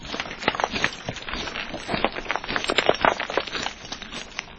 grave buster chomp

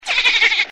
dolphin before jumping

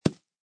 grass step

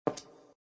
tap

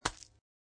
kernel pult

